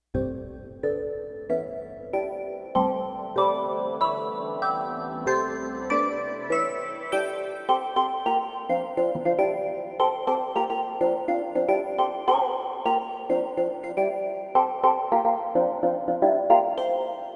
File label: Long Dream, Roland VC-1